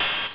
hihat open; Amiga Soundtracker sample

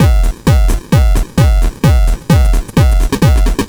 Crushed Beat 130BPM; musicradar

